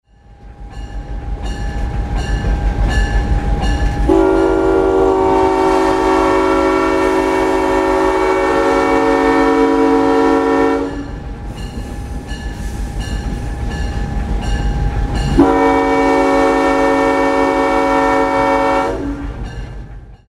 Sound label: horn, train; M5